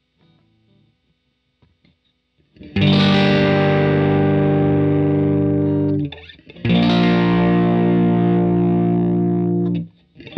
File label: squire quilter r121